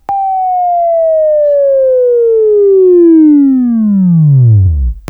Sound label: Buzz; Labview-Program; Sweeping Training program